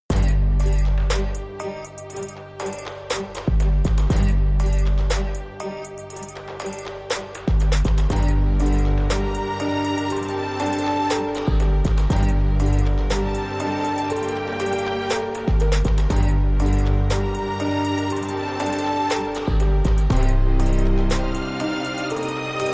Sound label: Trap Step Symphony